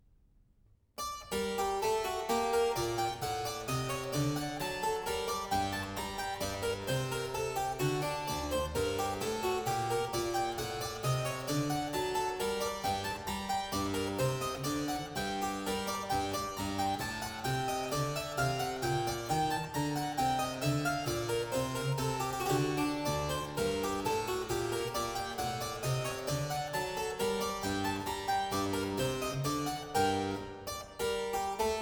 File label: Harpsichord